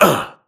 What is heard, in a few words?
grunt hits; Titanfall 2